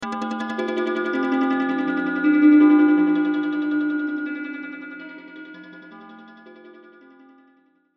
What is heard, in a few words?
Musical Metallic, Musical Metallic Chime Reverb; Metallic Sound; Blastwave